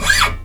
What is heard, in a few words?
NYL SCRAPE, GUITARS; Synclavier Sampler Library